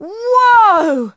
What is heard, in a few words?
Emote